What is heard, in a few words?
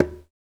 Congas, African Contemporary